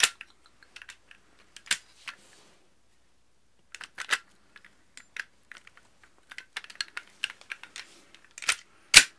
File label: Gun Handling Weapon, Weapons Foley; SFX Kit